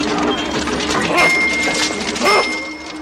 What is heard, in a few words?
clinking armbands